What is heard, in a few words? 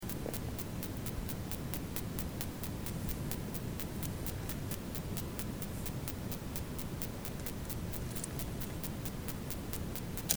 Ctenodecticus bolivari; BIOAC ORTH